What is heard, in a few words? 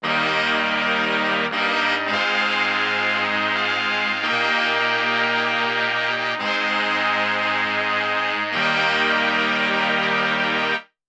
mtronii brass dry sample, Clavia Nord Wave; no additional effects